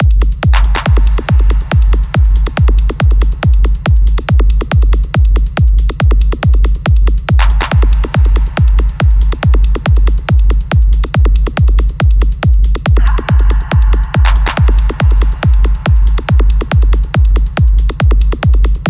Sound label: 140BPM, modern techno; teknovault modern techno production suite